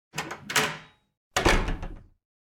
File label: door open close community center; anemoi